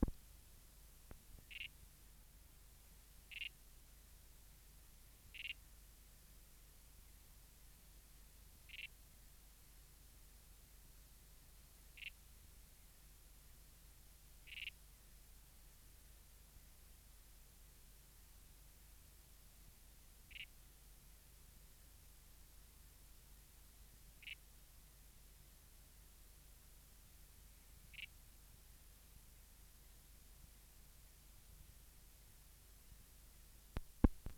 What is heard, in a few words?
Leptobrachium heteropus